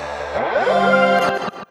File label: Swoopin; Sonic Foundry (Sony Creative Software); Synchro Funk